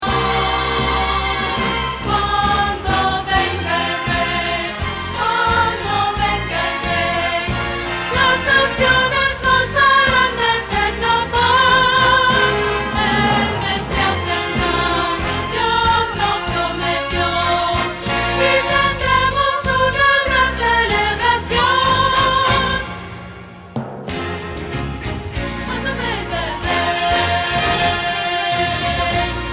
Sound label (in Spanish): Contralto